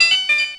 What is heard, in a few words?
Call Disconnect; Clean